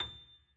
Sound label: key; keySounds